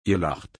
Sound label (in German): lachen